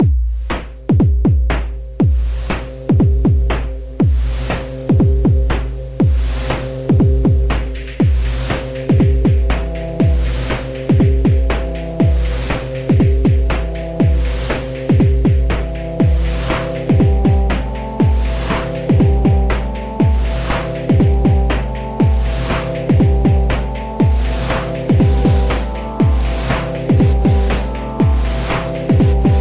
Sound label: drumthing